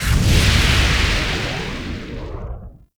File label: Hazard Cast; Ember Prime Boss; Warframes